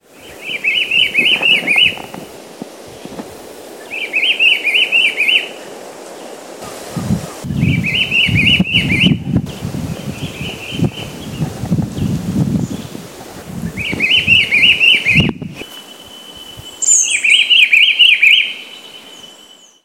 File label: Cychlarys gujanensis; Pq Ferradura; Canela